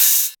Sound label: natural open high hat sound a key, Royality free open hi hat one shot tuned to the A note.